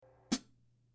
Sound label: 15 K Custom HiHats Chick, cymbal